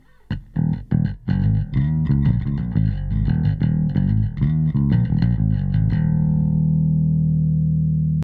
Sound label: bass; AMEK